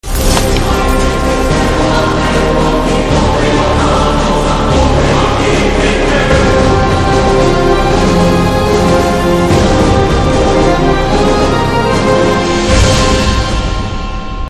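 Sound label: Trailer background music Ringtone; Nerkonda Paarvai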